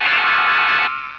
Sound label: jet